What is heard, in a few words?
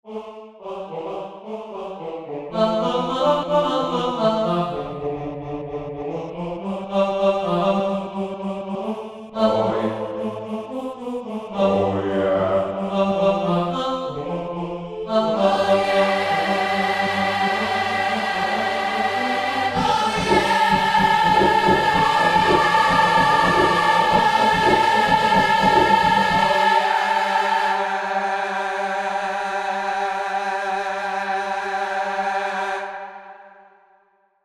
acapella cover